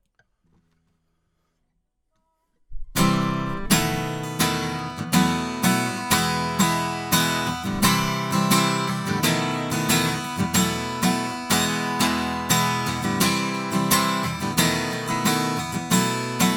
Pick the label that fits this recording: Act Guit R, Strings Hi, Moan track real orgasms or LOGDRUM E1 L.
Act Guit R